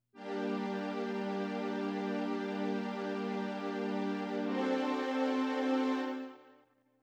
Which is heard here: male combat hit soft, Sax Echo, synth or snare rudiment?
synth